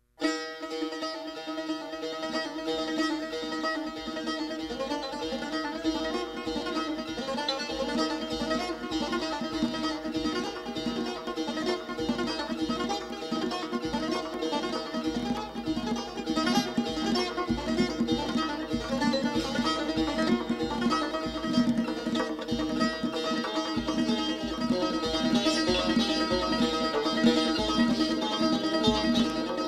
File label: Instrumental